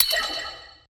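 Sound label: Design misc Kill Confirm Metallic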